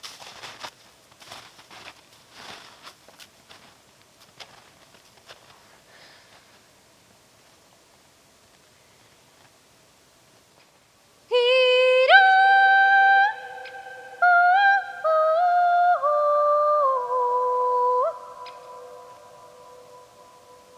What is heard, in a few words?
A northern herding call in the forest